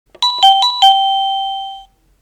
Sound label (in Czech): melodie